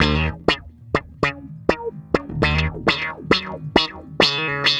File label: Bass Loop, Ambient Grooves